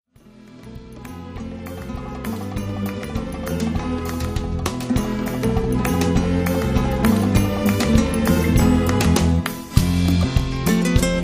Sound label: Flamenco